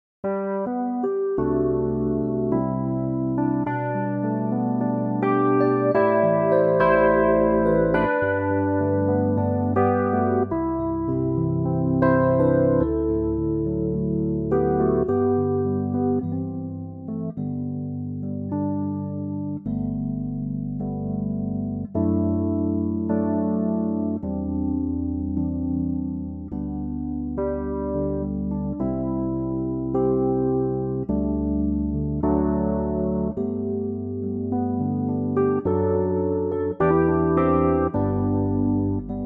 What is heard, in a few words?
G Arturia EP